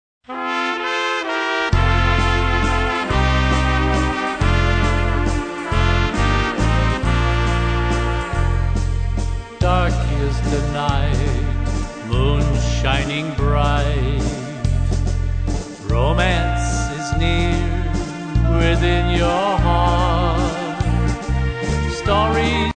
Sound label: waltz